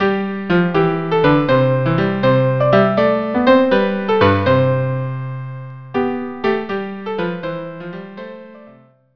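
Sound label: viola cello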